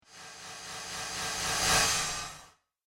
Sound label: Pumping Space Gas; Spacecraft Sound Effects